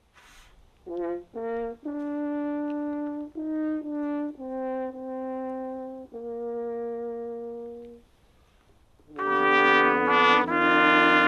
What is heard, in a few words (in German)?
Blechblaeserquartett, trauermusik